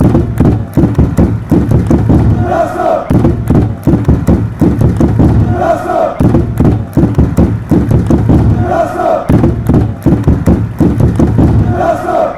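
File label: fanchants, football chants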